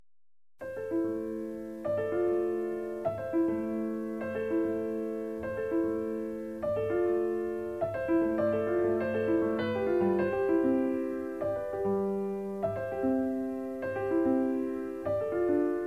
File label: piano seul